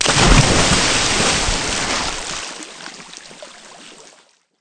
water splash

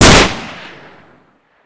sniper fire

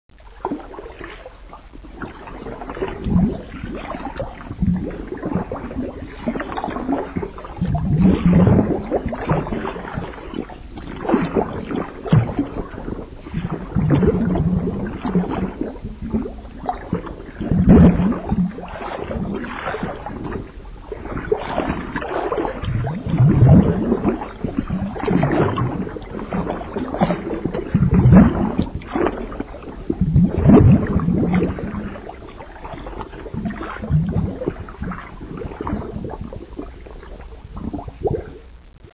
shark swim peace

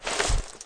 movement, weapons

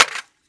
browning mag out, weapons

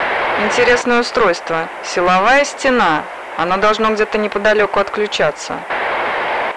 radio

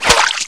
hit water

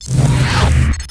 gravity gun pickup

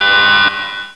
alarm

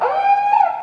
warn

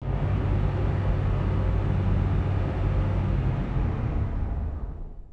small fan

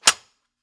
mp5 charger forward, weapons

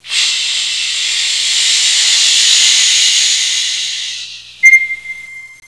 pressure

